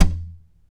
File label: TAP, FLAMENCO Guitars